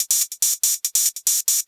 Hat 142 BPM, Club Techno, Drum Loops, Hat Loops; Zero-G

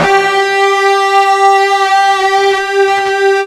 FUZZ G 3 L, Guitar; East West; Steve Stevens Guitar Samples Collection

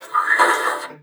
PUSTE, BASSOON; Xsample VOL-6

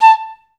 STAC A4; Best Service; Peter Siedlaczek's Advanced Orchestra

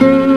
HIT, Hits & Brass

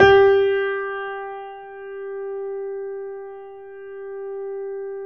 G 3, GRAND P; USB Soundscan vol.17

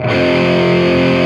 BAR F, HEAVY METAL; Greytsounds

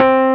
EPF C 4; AKAI S-Series CD-ROM Sound Library VOL-2

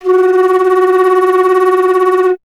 flute F#; Miroslav Vitous - Solo Instruments VOL-2; Solo lnst. II

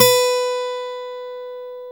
OVATION A GT B3; AKAI S-Series CD-ROM Sound Library VOL-7

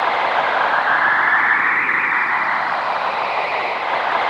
WHITE; AMG - Vince Clarke Lucky Bastard

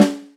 ROCK SN; Best Service; Alpine Volksmusik

